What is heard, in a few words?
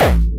Hard Kick